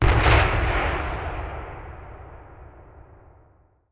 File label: aa explosion far